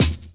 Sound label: Dance Mega Drums, KIT D+B; Best Service